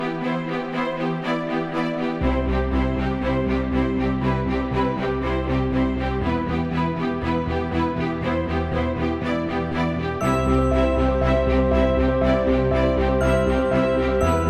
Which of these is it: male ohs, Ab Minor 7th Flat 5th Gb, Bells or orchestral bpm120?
orchestral bpm120